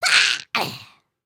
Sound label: Die; Lego; Strombie; creatures organic